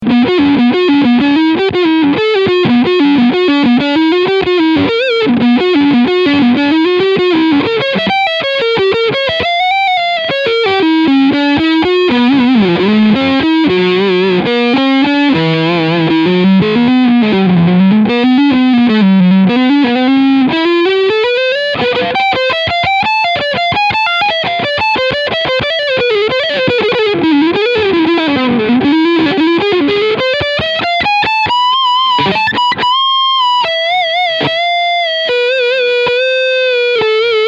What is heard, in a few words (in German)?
7 String Leadsound beide Humbucker parallel; Dean Guitars Exile Select